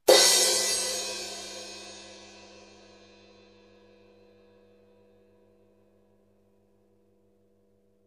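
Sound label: crash, ZXT 18 RC; ZDJ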